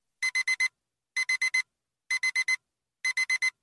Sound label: short alarm clock